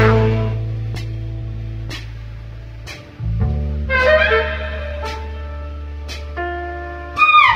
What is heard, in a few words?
horns squealing, jazz loops